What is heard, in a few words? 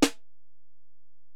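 Acoustic Snare, Alesis DM-PRO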